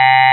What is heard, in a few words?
android, Digital Synths